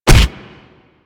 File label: Brawl Punch A; Warframe; Brawling